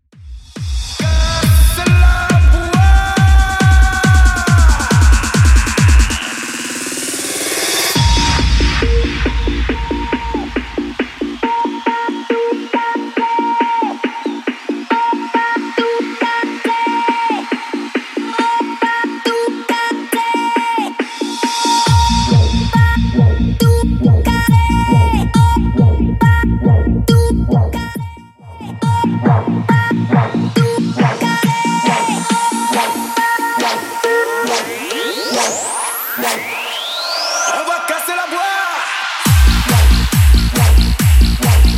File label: 90s Xtend 138bpm; Xtend Edit